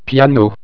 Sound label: piano